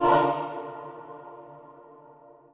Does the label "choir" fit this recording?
Yes